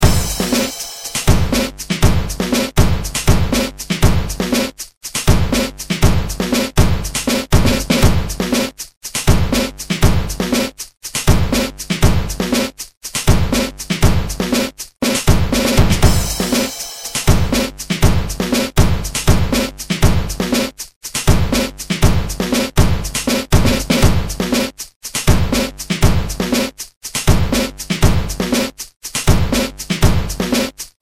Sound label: BEAT Drum and Bass; Rhythm Core Alpha 2